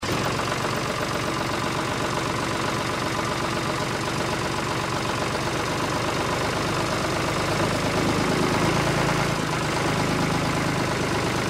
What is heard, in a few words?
Tractor